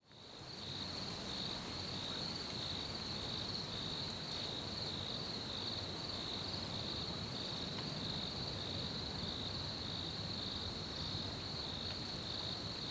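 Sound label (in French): Nuit, Paysage sonore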